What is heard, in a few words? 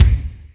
havok kick; Greytsounds; Scott Peers Sound Engineering